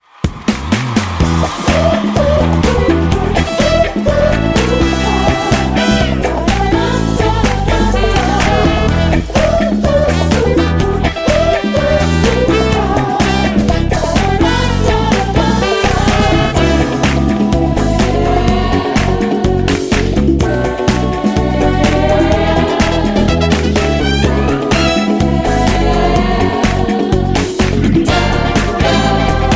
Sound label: Disco Song; despicable me minion rush full original score soundtrack